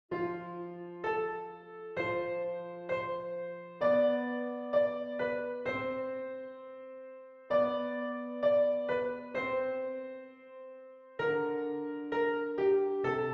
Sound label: rocking carol easy piano